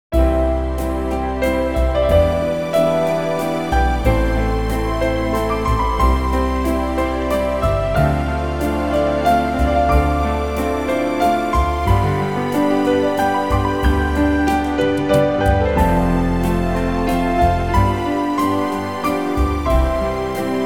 WALTZ

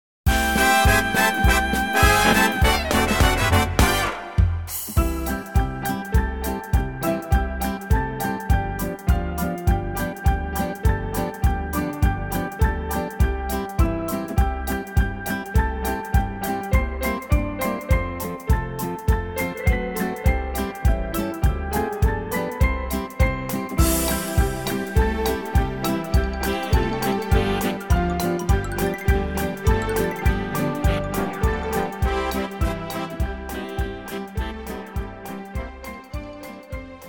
mary poppins medley swing

